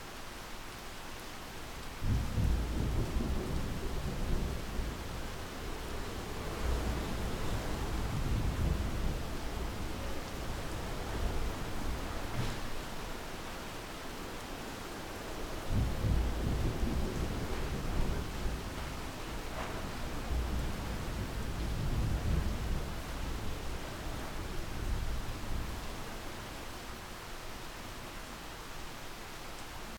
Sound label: outdoor storm